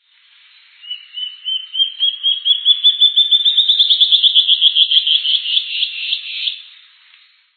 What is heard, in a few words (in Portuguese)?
solta asa